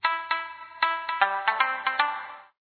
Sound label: instrument shamisen